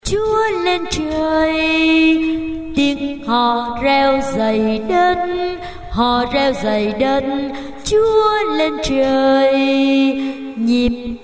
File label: basso